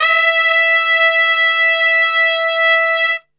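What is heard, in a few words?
E 3 piccolo trumpet, Brass; Best Service; Brass Super Section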